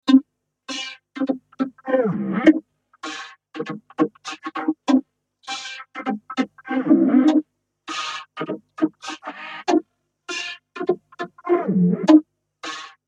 SVC-350 Beatbox Hold

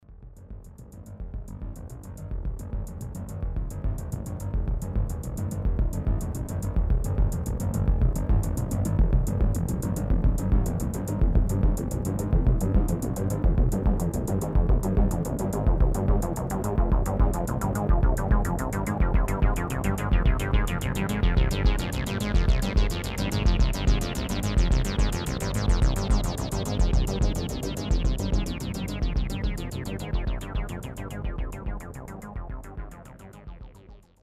synth drum

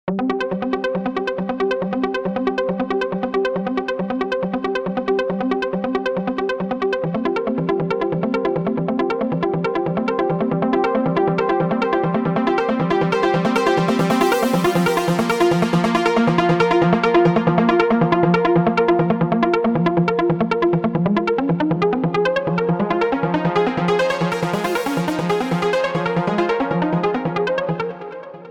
Mini 2 Stack Arp Panning Sequence

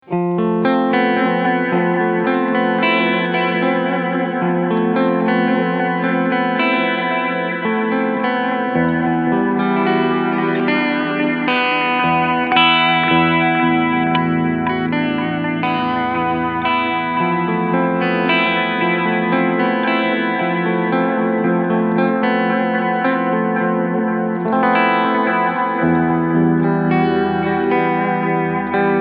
Delay Tape

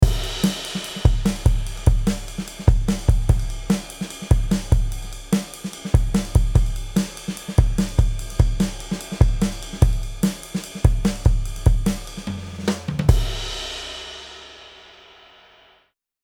Drums comp 4db; Chandler TG-1

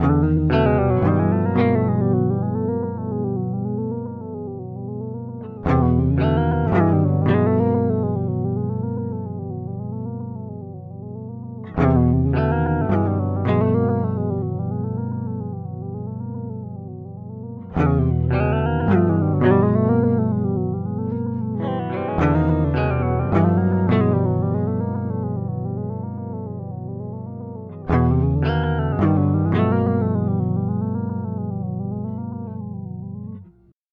Electro Harmonix Mod 11 Pitch

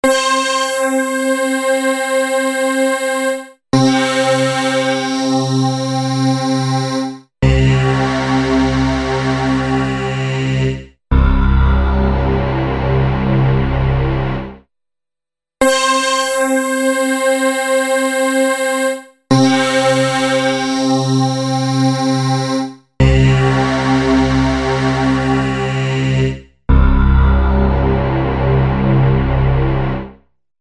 AMBIKA 96kHz 4 oktaves; EIII

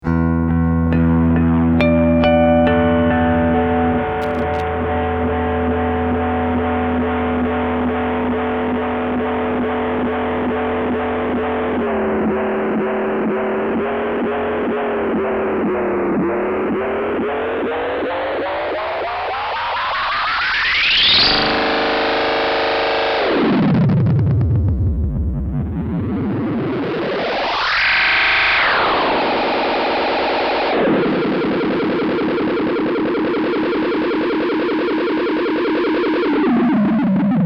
Oscillator Mode

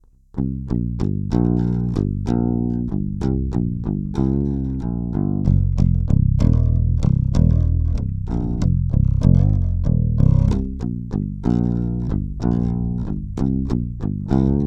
Epiphone Flying V Mod Drop C

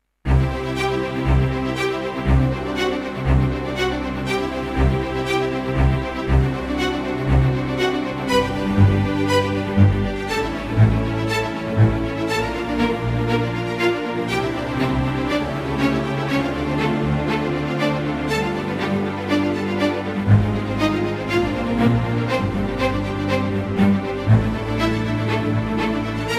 Speed Ostinator

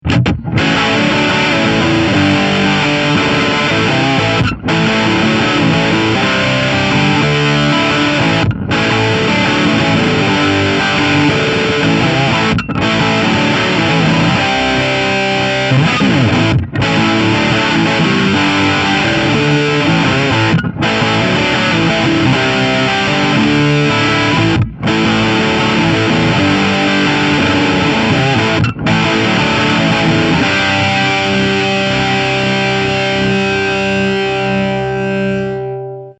VOX AC100 High Gain

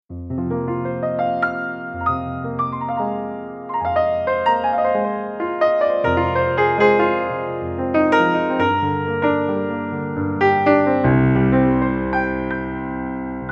Nord Grand Velvet Grand

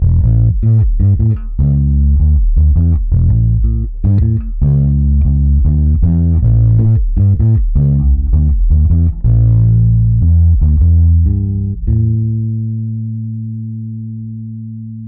Elektron Analog Heat, Ebass Sub

